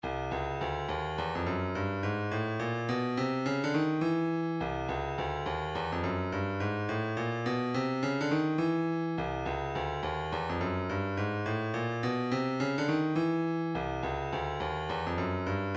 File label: 105 room fx, midi